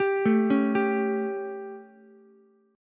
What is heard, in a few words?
G Suspended 2nd Flat 5th G down Ukulele; Gsus2b5 arpeggiated; JGuitar